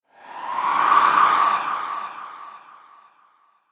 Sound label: Whoosh Ghost By, Horror, Pass, Howl; Ghosts; BlastWave